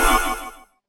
dark magic

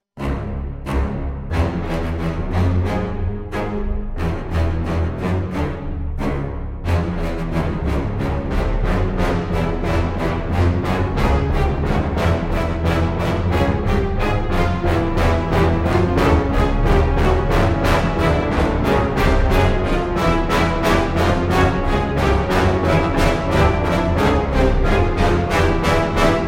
boss battle, music; freepixel; WordJourney

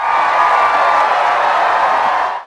crowd cheer; Sports & Arcade; freepixel

